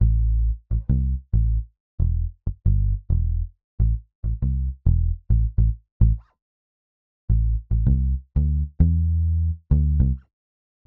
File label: Island Reggae Bass